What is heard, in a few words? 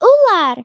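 snake; ular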